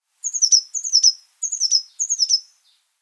Teneriffameise Parus teneriffae; Vogelstimmen

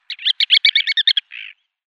Steinwaelzer Arenaria interpres; Vogelstimmen

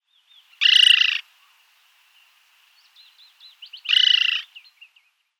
Steppenweihe Circus macrourus, Vogelstimmen

